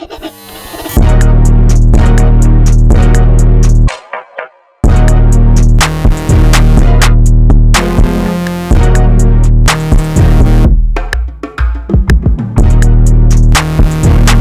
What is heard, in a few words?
trap beat